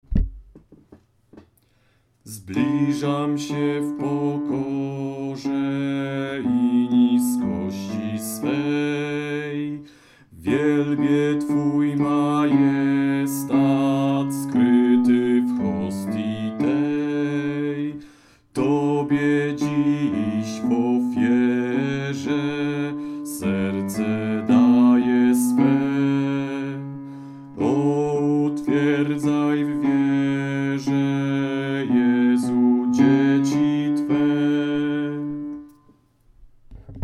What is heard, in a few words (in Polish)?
Zblizam bas